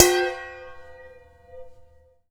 BOWL RING; Rarefaction; Poke in The Ear With A Sharp Stick

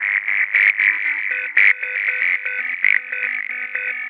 Synth Sequence; Eclectic Electronica

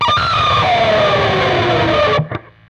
PRS SCRAP, Guitars; PRS SCRAPES

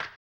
HAMBONE; ILIO; Vocal Planet; Blues & Soul

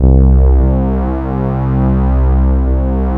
classic C2, sweep; CLASSWEEP

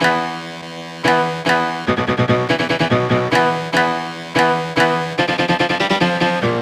Tornado Clavi; Sonic Foundry (Sony Creative Software); Club Joints and Street Anthems

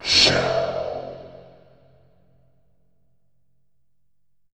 SSSHH, Tubevox Wet; Effects

